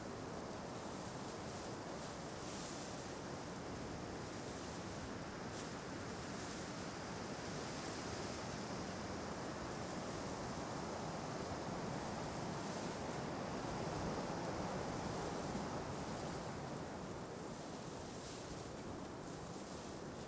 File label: outdoor forest; anemoi